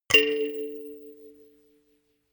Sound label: notification kalimba rattle fifth